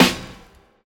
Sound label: clicky snare sound E key